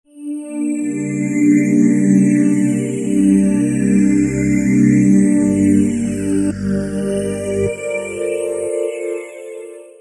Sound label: Evil Bubbles, Roland V-Synth; Factory Patches